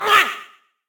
gobbo pain; Fight Knight

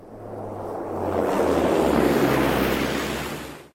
Landing; Pocket Planes; android

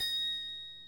Chime

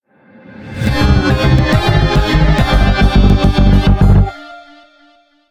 Achievement Jingle; A Robot Named Fight

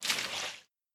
deepwater footstep

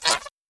Monster Hurt

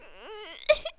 Boy Idle; In the Line of Duty Firefighter

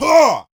warrior attack swing; gamerip

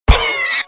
Pigglette death

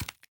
Footstep Run

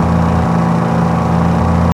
Bmw M2 High; car simulator 2

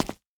Footstep Asphalt

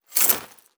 warrior walk foley

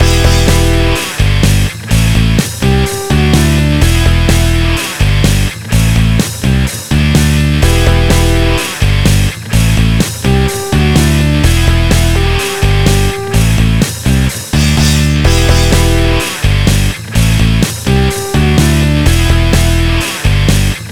Menu Loop Rock; Guitar Rock Tour; dsiware 2008 nds gamerip

